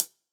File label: Acousti Hat Hit, hihat; ultimate hihat; Hits